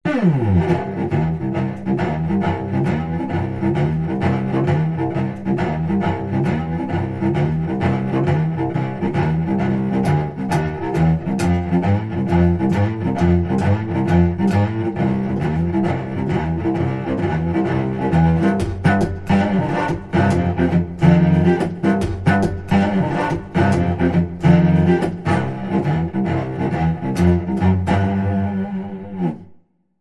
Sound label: Cello Boogie